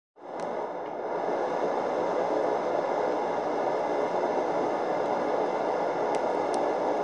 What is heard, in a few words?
airvent loop